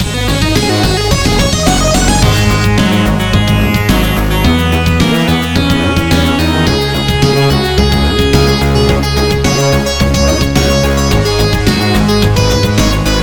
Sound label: ribbit, music; roflnauts